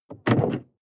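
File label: sports car interior trunk close hard; verkehr transport; autos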